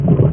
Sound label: thick; tuxpaint